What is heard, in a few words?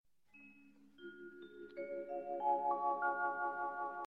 Melody Alert